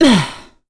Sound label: Vox Landing; Priscilla; kingsraid